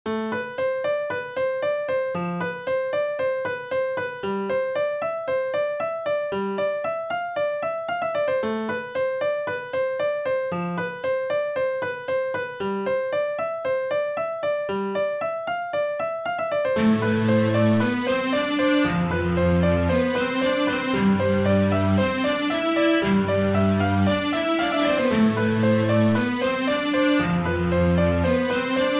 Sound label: toxic rnb